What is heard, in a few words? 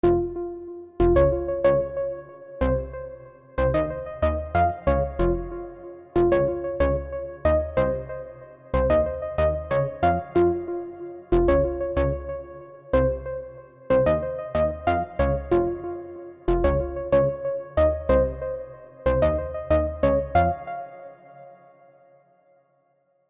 186 BPM F minor